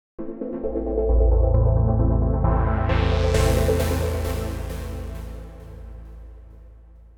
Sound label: pad filter